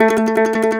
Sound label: MAND TRM, Mandolin